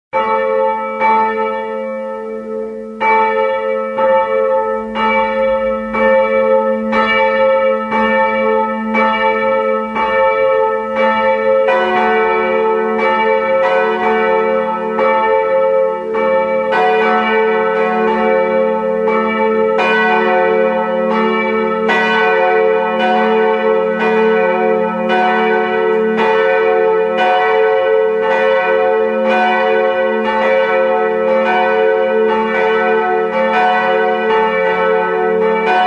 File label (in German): Klingelton; St-Marien